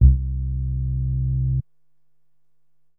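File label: synth bass, drum and bass, 160 bpm, C; eJay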